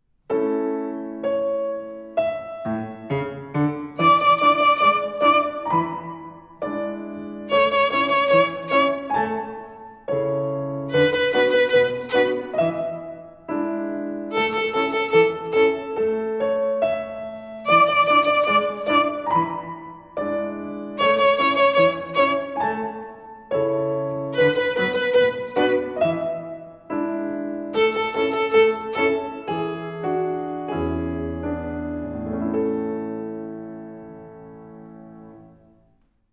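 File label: Rhythm with Three Fingers bowed, violin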